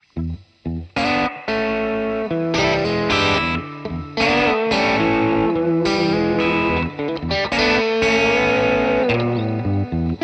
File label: blues lespaul JCM800 hot; mercuriall